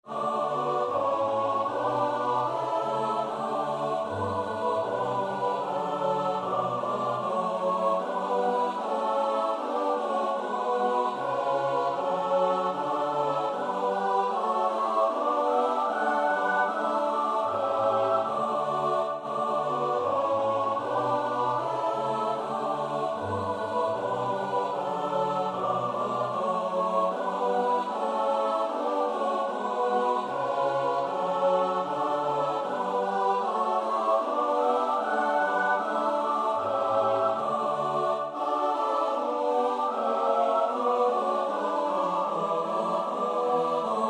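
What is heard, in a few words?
soprano alto tenor bass; Bach (Johann Sebastiaan); BWV 174; Herzlich lieb hab ich dich, O Herr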